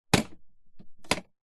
household lawnmower electric cordless metal handle fold